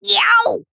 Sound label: cartoon Yeow